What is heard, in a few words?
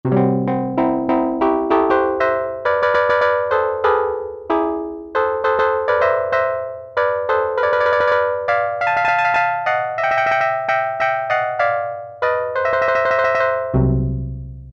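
steel drum, Kawai SX-240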